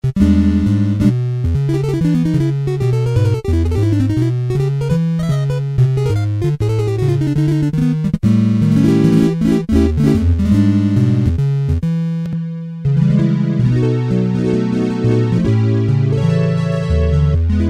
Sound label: guitar, Technics SX-K450